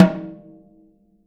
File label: SN OFF, snares; Sampleheads; Peter Erskine Living Drums